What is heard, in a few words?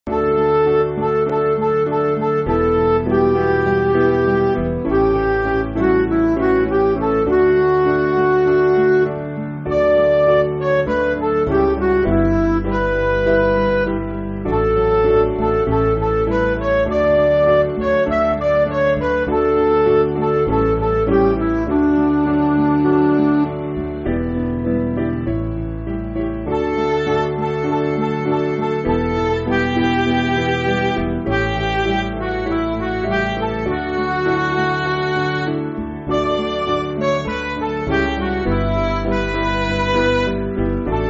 Midi, Music Backing